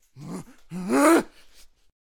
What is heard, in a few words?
Attack Vocals; Death Bringer